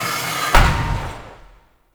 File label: Medium Piston Fall; Warframe; Eidolon Plains